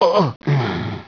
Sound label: DYING; BattleSNDS